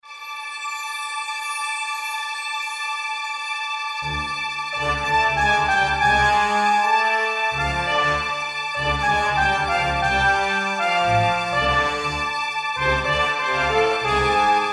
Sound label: Film Noir theme With Horns